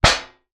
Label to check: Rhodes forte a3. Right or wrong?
Wrong